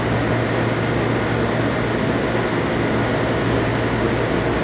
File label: ambient industrial; atmosphere; Counter Strike Model Pack; CS:GO